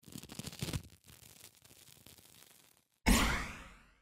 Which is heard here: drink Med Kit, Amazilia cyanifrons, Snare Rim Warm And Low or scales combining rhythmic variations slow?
drink Med Kit